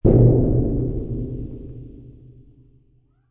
impact metal empty drum massive resonate

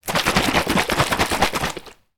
household water spray bottle shake water inside; sound effects one

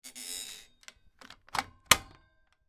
household stair gate close lock; sound effects one

